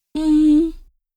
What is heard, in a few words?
hmm; dialogs